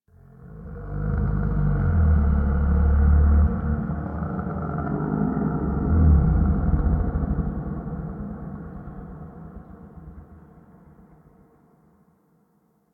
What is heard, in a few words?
Moan Exterior, Ambience